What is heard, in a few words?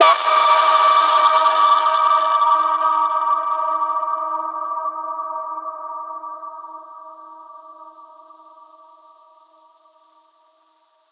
Synth